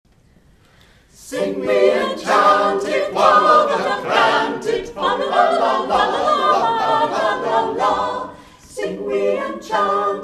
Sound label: Sing We and Chant It; overtones